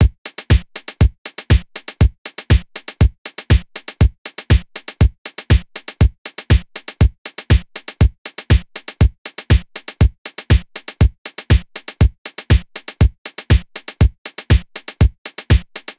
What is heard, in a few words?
Electro loop; Reference Beat